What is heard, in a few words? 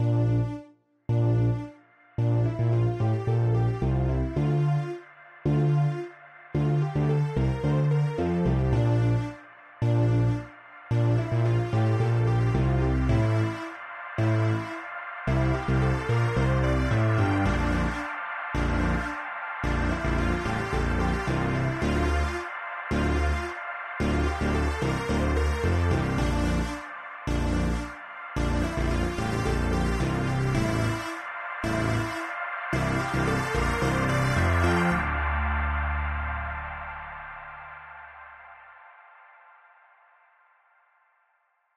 House Synth 110bpm